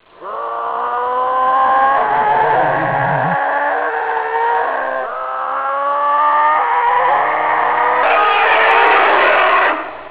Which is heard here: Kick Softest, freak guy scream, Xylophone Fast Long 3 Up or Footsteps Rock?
freak guy scream